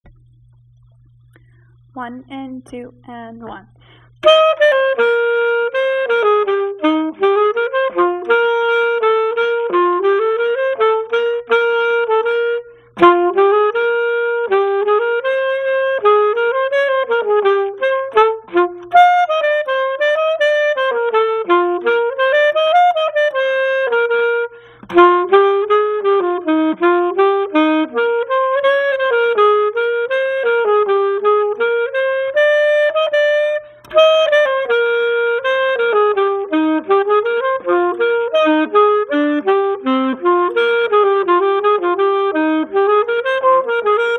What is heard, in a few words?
duet xii part 2